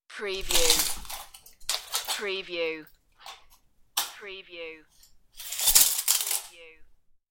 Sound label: Tape Measure